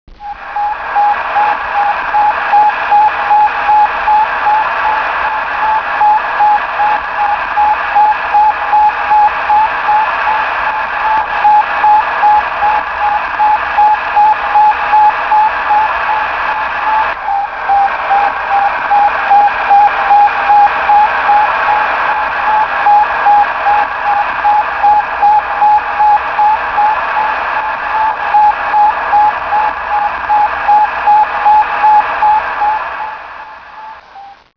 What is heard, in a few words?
Sputnik, satellites